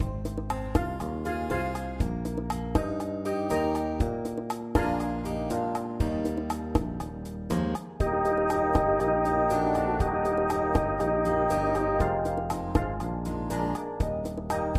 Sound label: Bassoon